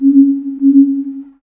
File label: nav buoy